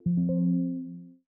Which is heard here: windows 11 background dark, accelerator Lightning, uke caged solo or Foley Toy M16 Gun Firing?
windows 11 background dark